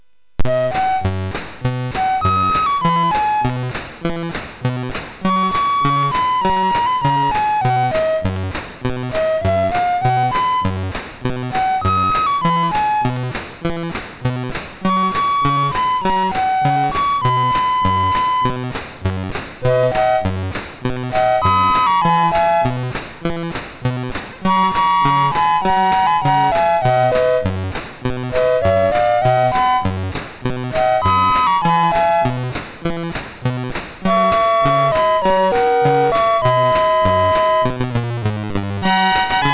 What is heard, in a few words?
Synth Sample, MOS6581R3; hvsc